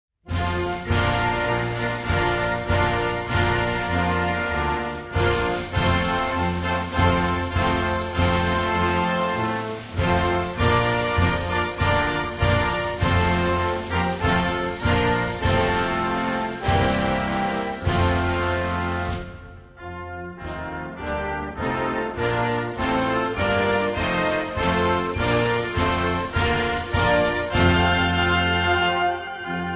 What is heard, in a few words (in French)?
hymne liberia